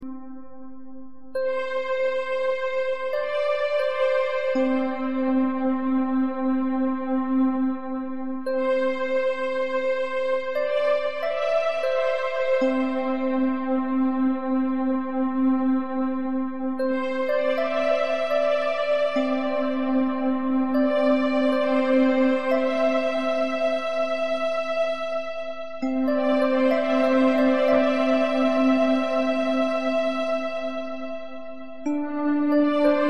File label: Meditation and relaxation music